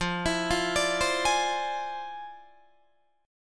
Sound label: F Minor Major 13th, Guitar, DADGAD